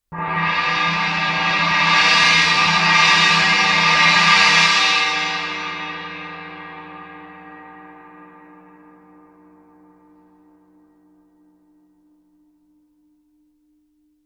chinese mallet roll, Suspended Cymbals; Percussion

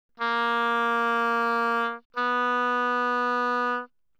Oboe ff Bb3 B3, woodwinds

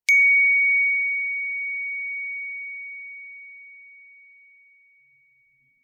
bells brass ff Db7; Percussion

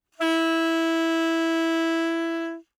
Alto Sax No Vib ff E4, Eb Alto Saxophone; Woodwinds; MIS Pitches - 2014

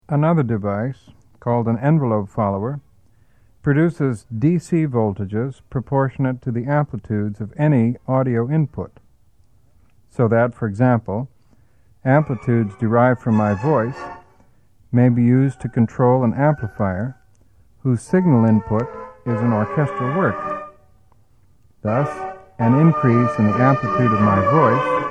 Envelope Follower

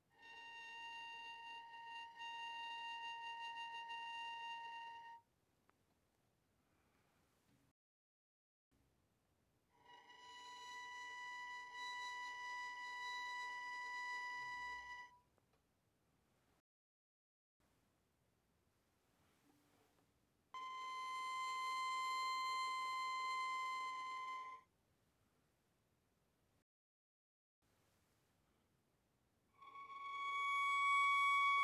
Violin arco pp sul A Bb5 Ab6

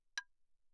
clave, hand percussion; claves

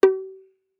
Violin pizz ff sul G G4; Strings

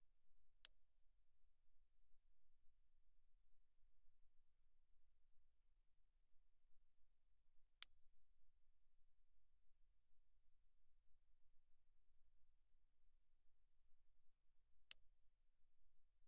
bells, plastic, mf, C8 E8; Percussion

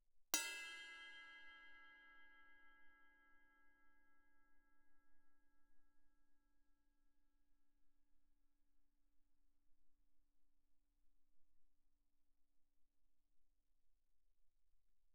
crash stick bell mf, suspended cymbals; Percussion

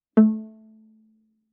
Viola pizz ff sul C A3; MIS Pitches - 2014; Strings

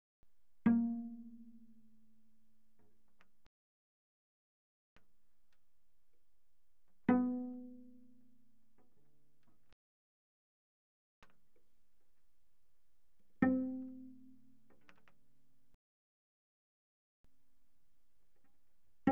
Cello pizz mf sul G C4 Bb4; Strings

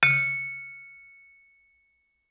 Vibraphone dampen ff Db3 stereo; MIS Pitches - 2014; Percussion

